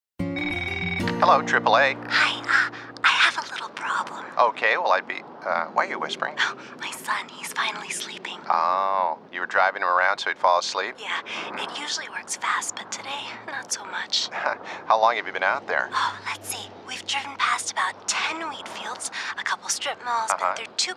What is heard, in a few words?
lullaby, voice samples